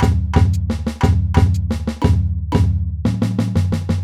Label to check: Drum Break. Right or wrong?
Right